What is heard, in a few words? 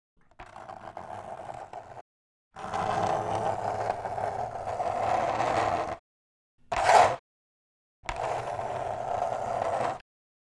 Nails On Chalk Board